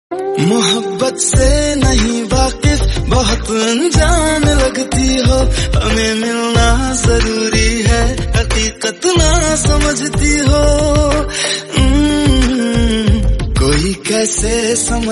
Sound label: Hindi Love Ringtone